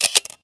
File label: click, turret floor; hl2